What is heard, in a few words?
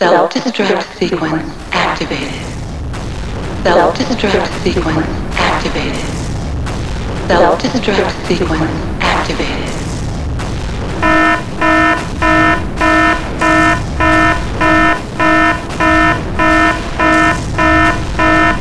self destruct; svencoop